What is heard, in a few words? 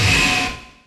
IND; Zero G; Man Machine